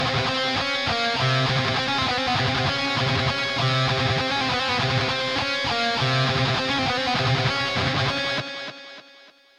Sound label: Drums and Jingles Evil Lead